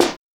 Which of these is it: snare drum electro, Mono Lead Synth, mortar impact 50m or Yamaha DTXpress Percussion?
snare drum electro